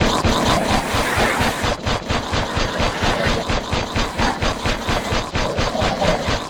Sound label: Mimic Loop